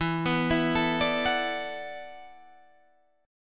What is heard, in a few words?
E 7th Suspended 2nd Suspended 4th, Guitar, DADGAD; chord shape